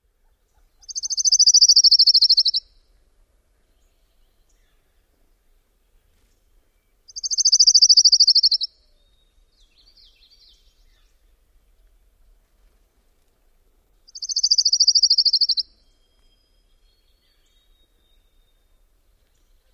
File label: songs